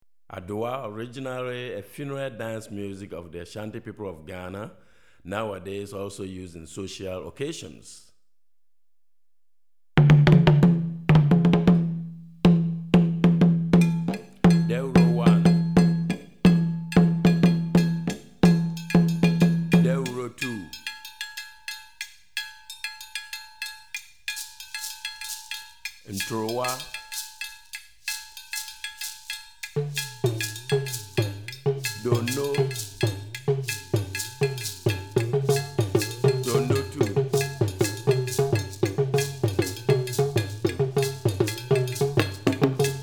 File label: Adowa Ensemble, West African Rhythms For Drumset; Hartigan